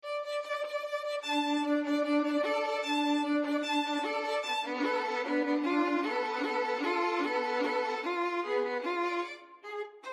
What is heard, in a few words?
poules violin duet